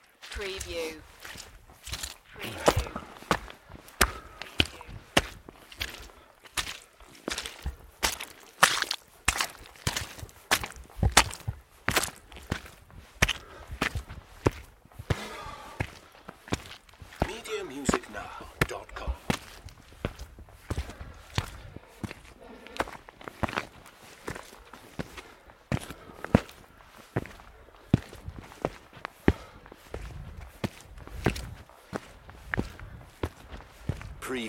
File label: Hiking footsteps and breathing; beanstalk audio